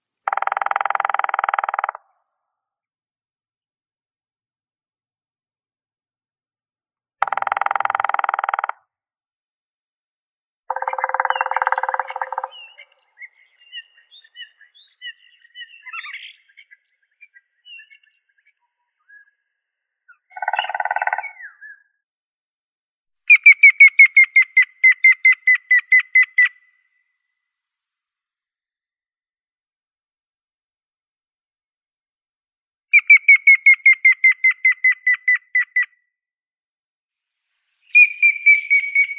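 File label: grey-headed woodpecker, Picus canus; dyatel sedoi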